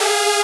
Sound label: synth